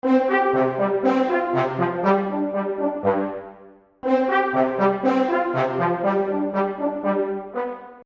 Seamless Music Loop Brass; Musical

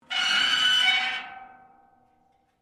METAL RUSTY SCRAPE LONG; Metal (Sound Effects:Materials)

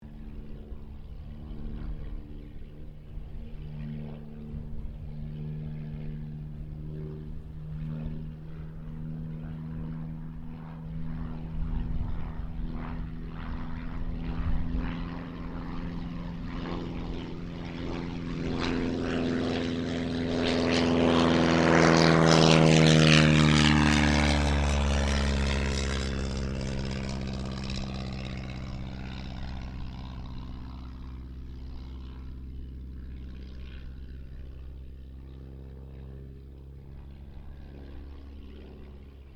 AIRCRAFT DH82A DEHAVILAND TIGERMOTH PASS; 1936 Tigermoth; Airplanes (Sound Effects:Vehicles)

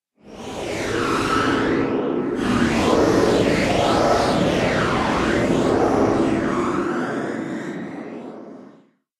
Scifi Afterburner, spacecraft; Spacecraft Sound Effects

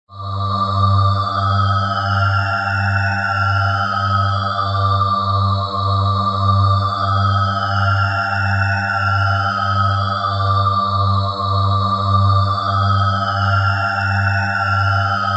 Forma Sweep B, thriller-horror ambience sound; Scary Soundscape Sound Effects

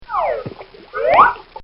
Comical Sound Series Whip Plop; Funny Sounds

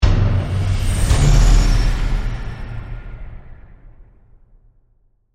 Hit To Whoosh; Hits; Sound Design; BlastWave

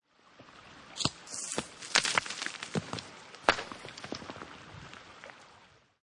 Leaves And Rocks Fall Into River; On a riverbank, rocks fall with leaves and twigs down towards the river; Debris

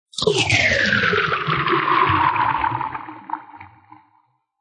Scifi Production Element Submerged; Lynne Music

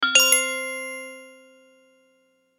Logo Bell Ascend, Logo Bright Sweep; Sonic Logo Sound Effects; Production Element Imaging Element Accent Transition

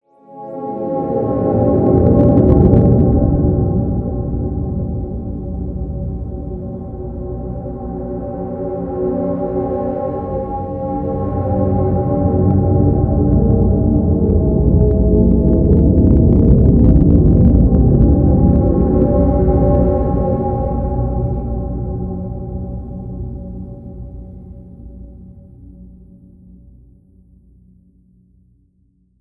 Space Ghost Moan, Space, Ghost, Moan, Bend, Eerie; Scifi; 5.1; BlastWave

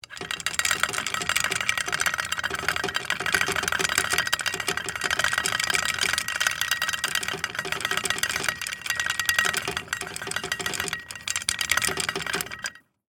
Chain Pulley Pull, Industry, Chain, Pulley, Pull; Factories (Sound Effects:Backgrounds)

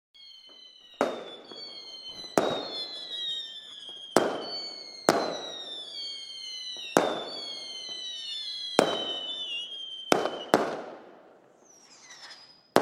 Whistling banging and crackling fireworks; Fireworks Screamers; Fourth of July

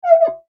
Foley Glass Squeak Clean Window Single Short; Squeaks

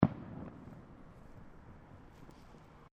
Fireworks Single Firecracker; Fireworks (Sound Effects:Backgrounds)

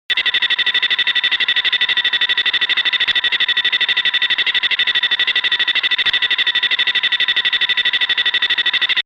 Beeps Data Transmission Technology; Beep Sound Effects; Scifi Science Fiction Multimedia; BBFX

